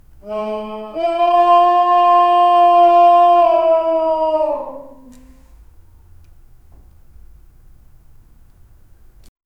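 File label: silent moans from the crypt; supernatural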